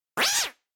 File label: Cat Synthetic